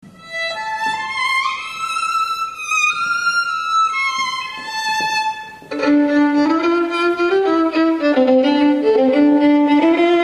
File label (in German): Violine